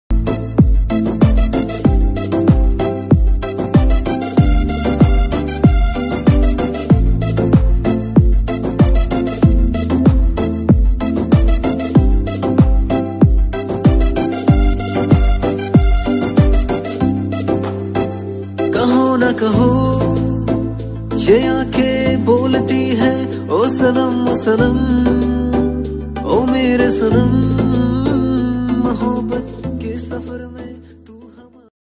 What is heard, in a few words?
punjabi ringtone punjabi love ringtone new punjabi song ringtone